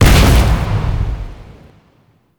Spin Beam Explosion; Enemies; Weapons; Warframe; Tau; Sentient Dactolyst

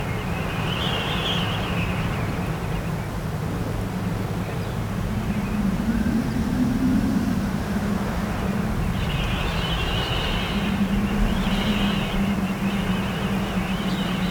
Infested Micro Planet Hub Door Wind Loop; Props; Warframe; Infested Eris

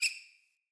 Rat Squeak Single; Ambient; Vocals; Verminia Messenger Rat; Animals

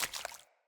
Mockery Walk Water, Footsteps; Mockeries

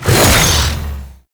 Flame Coil Ability Shot; Warframe; The New War; Archon; Amar; Abilities

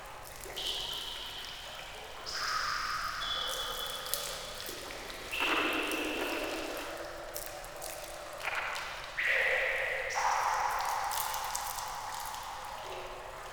Cave Ambient Loop; Venus Open World; Venus Landscape; Caves

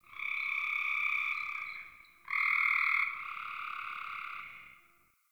Tree Critters; Ambience; Props; Warframe; Duviri

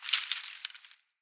Tree Pine Branch Break; Trees; Midrath Island; Ambience

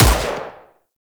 Rifle Shot; Warframe; Weapons; Corpus; Ruban

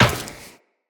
Run Metal Perforated, Footsteps; Tenno

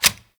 Sniper Reload Clip In; Warframe; Venkra Sniper Skin

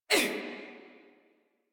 Pain Female, Battle Barks; Warframe; Modular Corpus Female

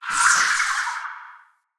flock projectile whizzby; Enemy; Abilities; Thawtide Hunter; Sinecure; Ranged Hunter Thawtide

